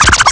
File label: scratch me; ST-XX Amiga Soundtracker Sample Packs